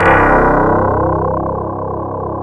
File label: DS8 C 1, Korg; Back In Time Records; Korg Universe VOL-1